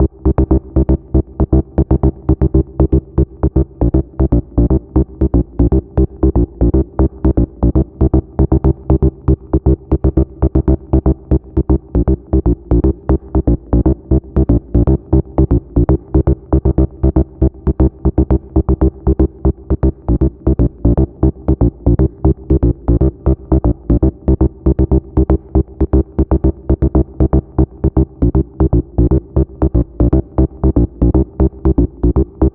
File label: Rave Cave Bass 118 C wet